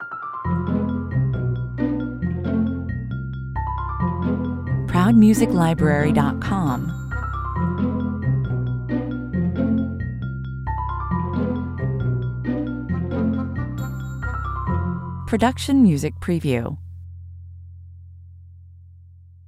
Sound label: (no drums perc)